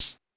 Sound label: Shaker, Clavia DDrum-AT, Pitched Up